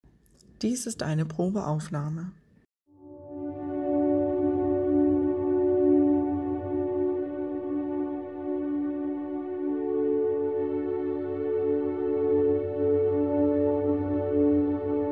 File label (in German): 285 Hoerprobe Deep Echoes